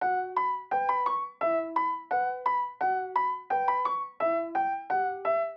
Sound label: Piano 172BPM F m Melodic